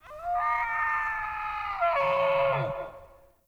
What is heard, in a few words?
Forest Open Glade Animal Far, Day